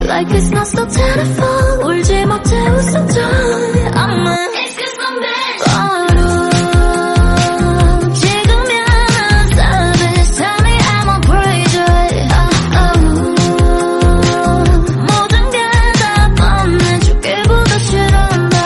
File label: Korean Song